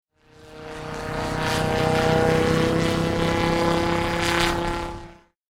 上空 ヘリコプター

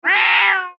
ネコの怒り声

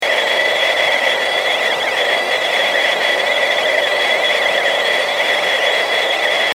通信不良

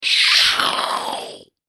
クリーチャー ステレオ

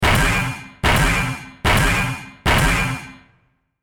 大型ロボットの足音4連続

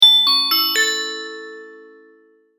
アナウンスチャイム

